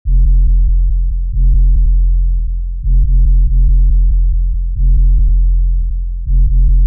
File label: bass punish